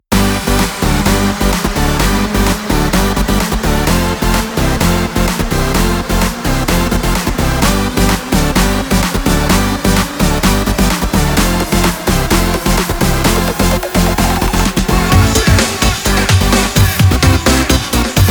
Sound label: future rave